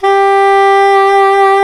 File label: SAX SOP SAX, Soprano Long